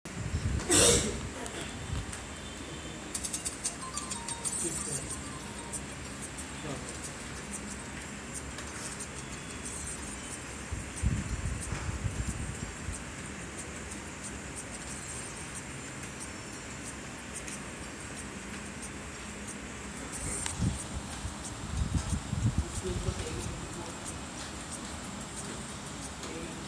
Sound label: karaoke recording